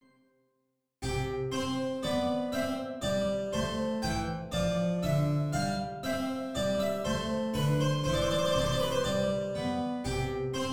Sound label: passepied